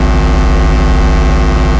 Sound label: Buzz